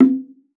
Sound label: BONGOS; Xsample VOL-9